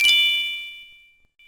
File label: Take Coin